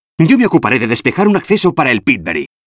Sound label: Bonus; arthur and the revenge of maltazard; wii